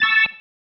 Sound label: Stab, Organ, One Shots; Loops; Stabs; Sonic Foundry (Sony Creative Software); Joe Vitale Organ Donor